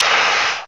PERC STEAM

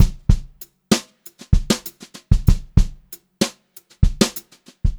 brushed drum loops; USB Soundscan vol.39; AKAI; 90 s Sample CDs; 96 HOTROD

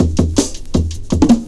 Peekaboo 162, breaks

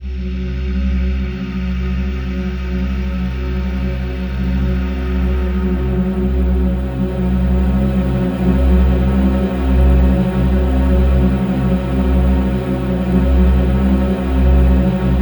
composite, ethereal atmosphere; Soundscan - Ethereal Atmospheres

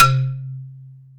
XYLO C 2; XYLOPHONES; AKAI S-Series CD-ROM Sound Library VOL-7

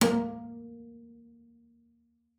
A#3, bass pizz; Xsample VOL-5

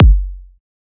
kick one shot clean; OLIVER

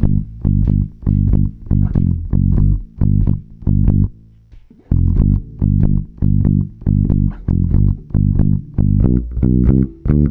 COWBOY 93, Bass Grooves; USB Soundscan vol.30; AKAI

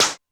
Hi Whip, Drum Machines Alesis D4

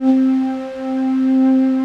C Synth, One Shots

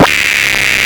Static 180, Hard & Loud Techno; USB Soundscan vol.01; AKAI

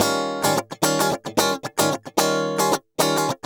GUI, 110 BPM

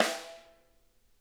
rim p L, snare; Sonic Reality; Interactive Drum Kits

